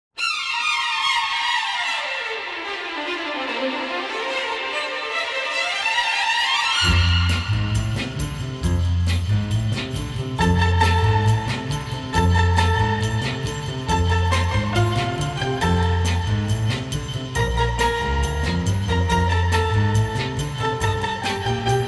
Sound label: jingle bells, strings